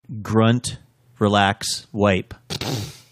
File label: grunt relax wipe